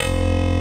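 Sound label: synth bass F1, Prophet 5; Greytsounds VOL-1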